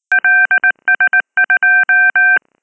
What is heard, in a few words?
beacon